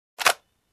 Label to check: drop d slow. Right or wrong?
Wrong